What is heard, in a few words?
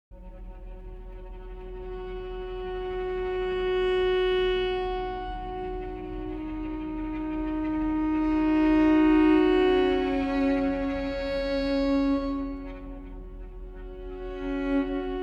Cello